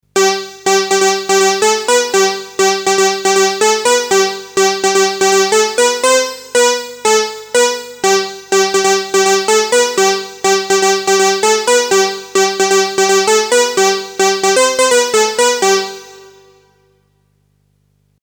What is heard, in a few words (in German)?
SH-1 Melodie